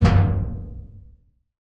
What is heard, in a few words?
impact thud as if object fall into large metal drum